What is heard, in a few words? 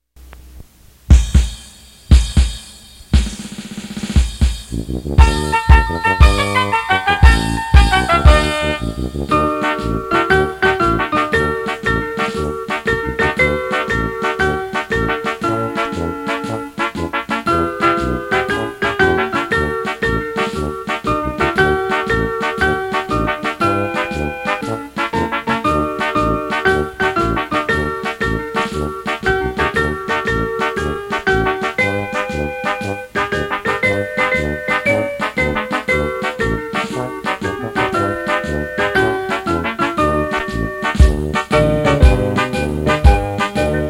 March; ICC